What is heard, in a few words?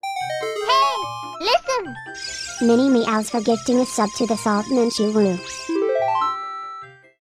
gift alert